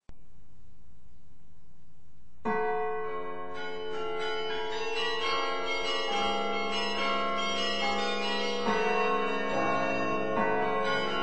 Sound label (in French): carillon